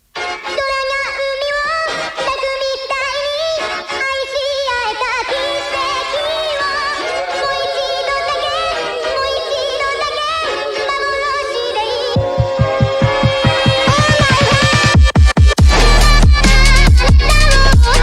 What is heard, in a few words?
phonk